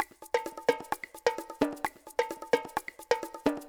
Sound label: Loop Percussion 130